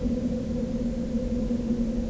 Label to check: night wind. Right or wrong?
Right